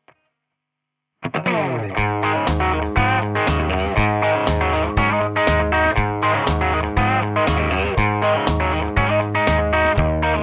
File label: crunch bridge; schwarzbach; Tele60s